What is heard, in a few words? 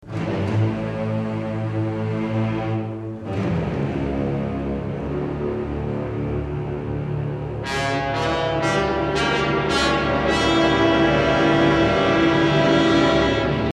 Toccata